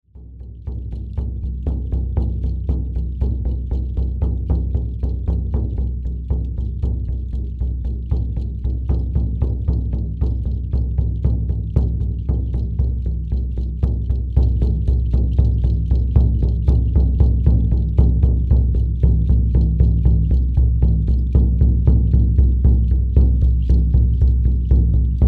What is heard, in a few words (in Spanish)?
Caja andina tradicional